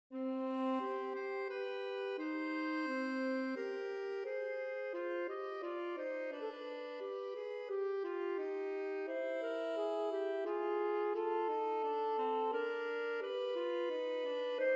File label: Adagio Duo Sonata in C Minor; LOILLET de GANT; Saxophone Duet